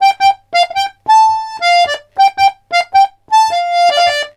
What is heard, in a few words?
RONDO, Accordions; USB Soundscan vol.40; AKAI